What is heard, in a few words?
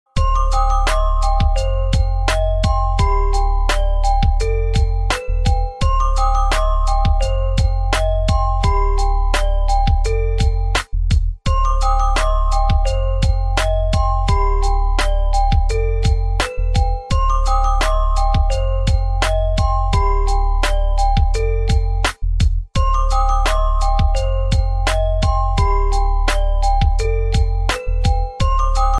iphone x ringtone